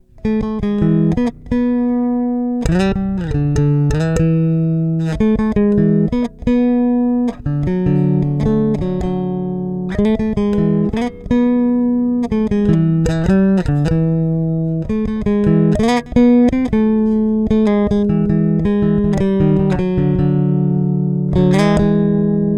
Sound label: Bridge Solo